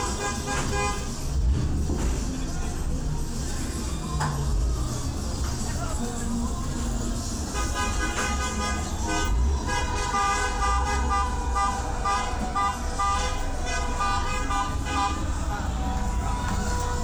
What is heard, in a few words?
foley